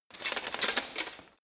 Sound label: walk single